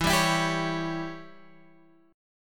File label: E Minor 6th guitar chord, Open G